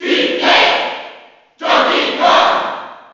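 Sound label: Cheer, crowd; Donkey Kong; SSB4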